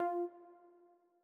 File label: Classic UI SFX Short High; gameflow-deck